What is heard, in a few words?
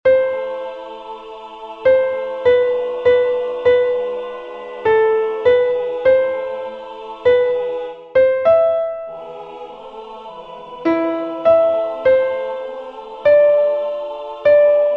Kyrie Soprano